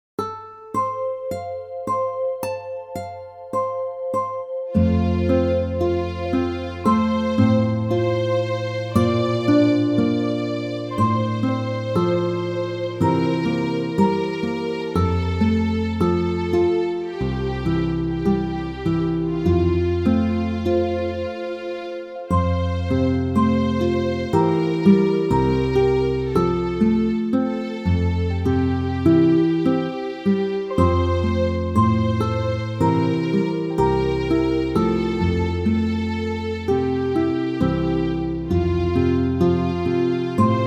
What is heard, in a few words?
Twinkle Twinkle Little Star Music